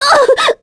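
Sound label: Vox Damage, Korean; Sonia; kings raid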